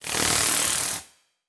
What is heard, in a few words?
Transition Electrical Surge; Production Element Title Transition